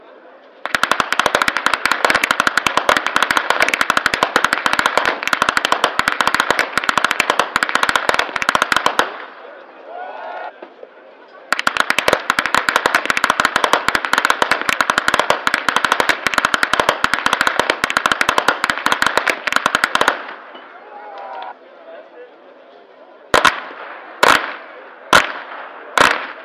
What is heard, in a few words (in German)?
schnalzen, flachgau